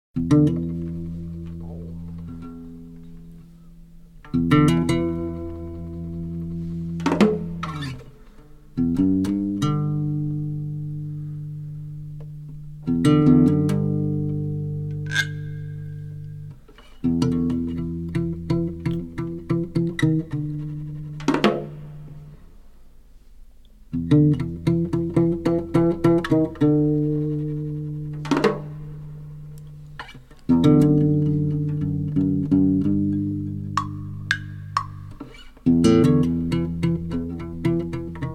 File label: Suspense